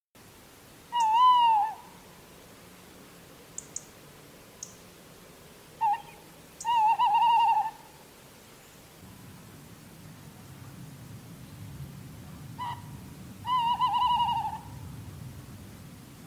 allocco maschio canto

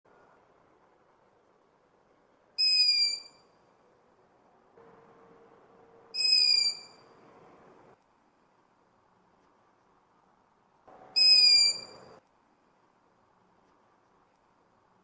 fischi giovane gufo comune canto

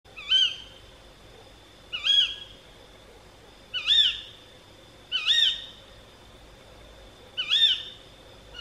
Femmina Allocco canto

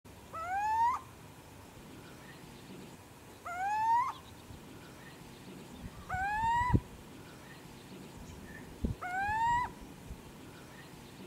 civetta maschio canto

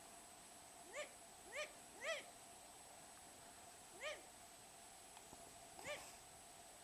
gufo comune CANTO ALLARME

